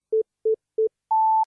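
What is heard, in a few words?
electric wave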